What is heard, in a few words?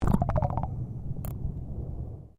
UNDERWATER MOVEMENT BUBBLES, Bubbles from water movement; Splash Sound Effects; Splashes (Sound Effects:Objects)